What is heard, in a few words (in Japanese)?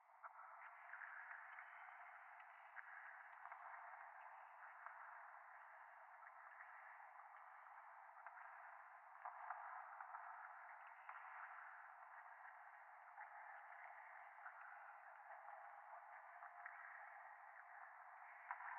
ゴースト フェラチオ 電車